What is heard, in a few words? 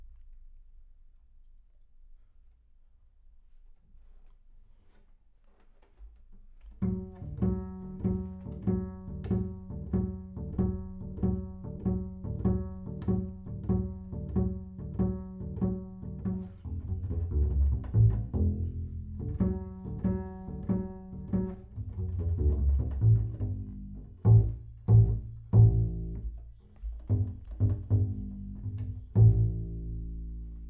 Bass Room